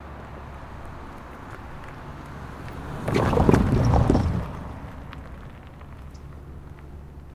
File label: Car Over Railroad Cro